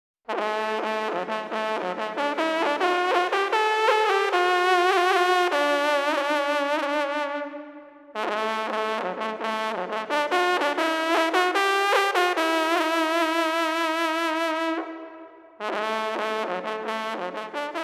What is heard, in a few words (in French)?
Fanfares des suiveurs de Rambouillet, trompes